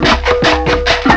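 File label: RHYTHM, PERCUSSION; CMI Sound Library Rev 1.3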